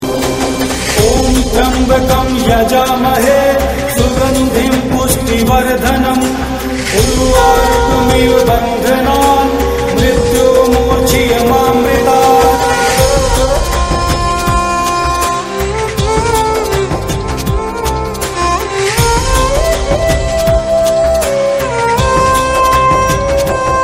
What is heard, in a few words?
Female Version